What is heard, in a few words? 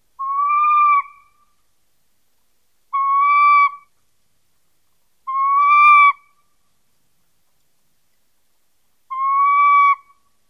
calls